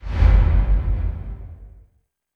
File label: ui large dialog open